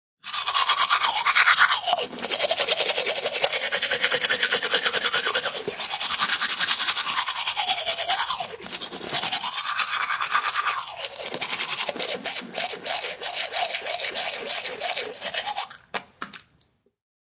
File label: Brushing teeth.; Brushing Teeth 1; Human